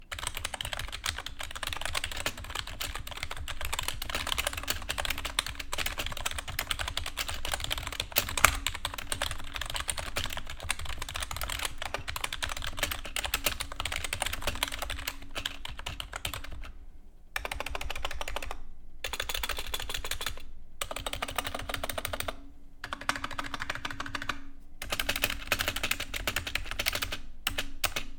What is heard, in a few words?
Silver Monkey X Mandrill soundtest